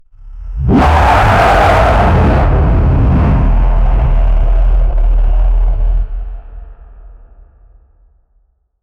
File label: a gut shaking tremor that, creatures